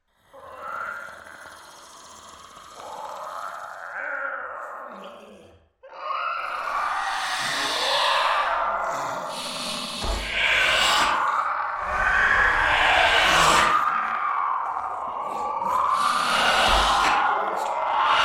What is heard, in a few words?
Baby Dragon Sounds